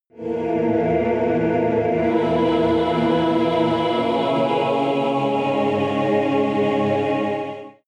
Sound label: evil choir chord sequence